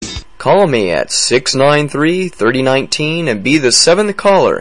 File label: Good Old Blues